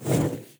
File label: tower necromancer basic Attack bolt Summon